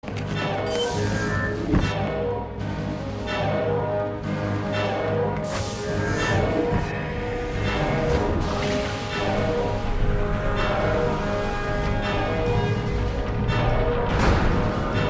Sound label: alarm; atlantiscity